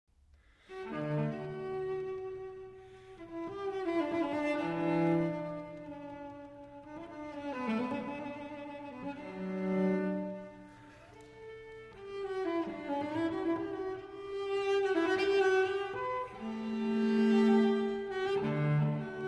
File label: Unaccompanied Cello Suite No. 6 in D Major Allemande; BWV 1012